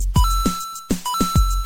coins

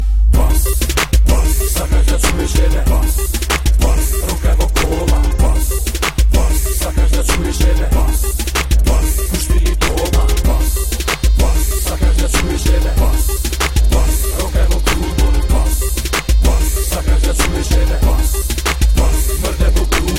zen bass

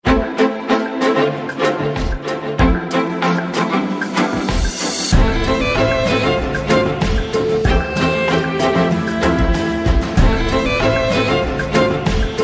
samsung galaxy s4; breeze

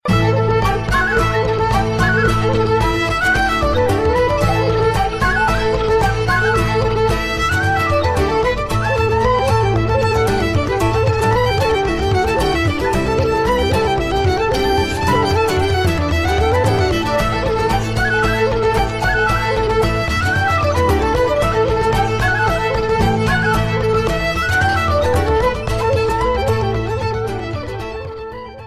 irish tavern music

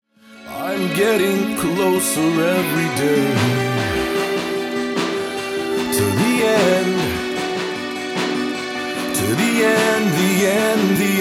Acoustic

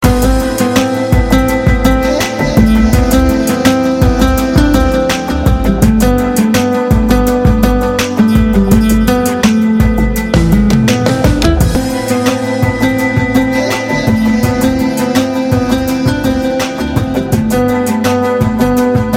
cool guitar

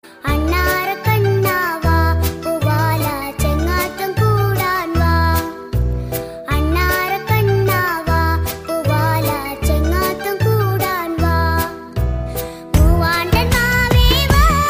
cute baby

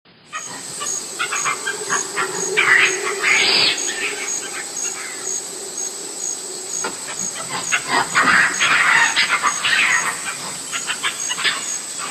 jungle monkey

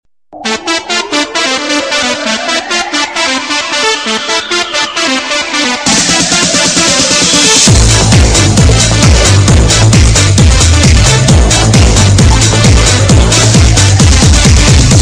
cool music

